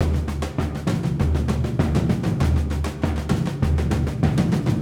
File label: Surdo; Ambient Grooves; World Groove